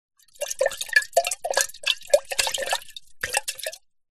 household teapot ceramic tea inside movement splosh around lid off